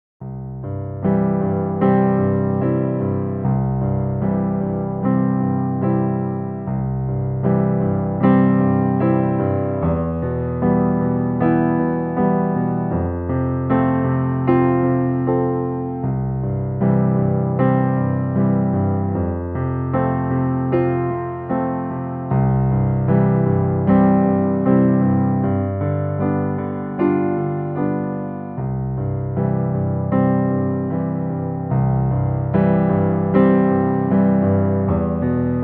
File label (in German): Klavier Solo